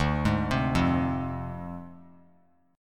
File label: D Minor 7th Flat 5th; Dm7b5; arpeggiated